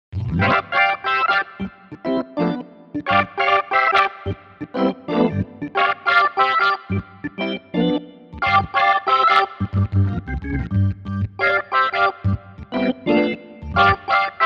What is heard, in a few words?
X Draw organ; montage